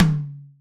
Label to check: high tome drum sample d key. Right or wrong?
Right